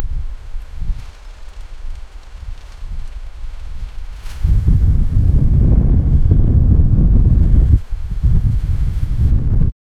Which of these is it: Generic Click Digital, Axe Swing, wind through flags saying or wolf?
wind through flags saying